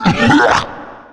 fly cough; pestilence

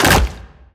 bighorn big hole crack

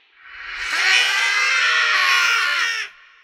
the forsaken scream

